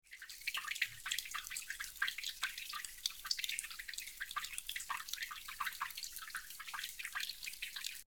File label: dripping pipe